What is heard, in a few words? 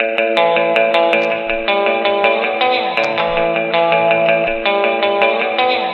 guitar, Phonky Loops